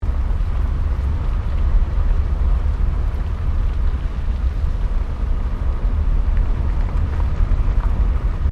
Car Toyota Starlet driving loop; Toyota hire car in Japan; Cars (Sound Effects:Vehicles)

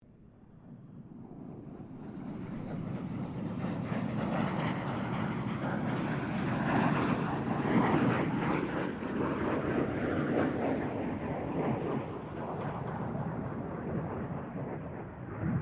AIRCRAFT CA-27 SABRE PASS DISTANT; CA-27/F-86 Sabre; Airplanes; Vehicles

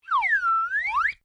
Whistle Slide Down Up, Simple slide whistle; Musical

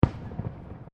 Fireworks Single Explosion; Backgrounds; Crafted Signals

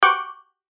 Chime Dissonant; Multimedia; Chimes; Blastwave

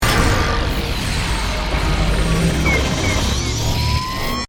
Stinger Swirl, Stinger, Swirl, Electrical; Production Element, Imaging Element, Accent, Transition; Stinger Sound Effects

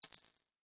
multimedia rollover, Multimedia Rollover Tone

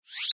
multimedia max min interface sound lazer max; Multimedia Interface ' Maximise ' Sound ( Lazer Style Tone ) Ideal For Maximising Of Brows; Tones (Sound Effects:Sound Design); McKinney Sound

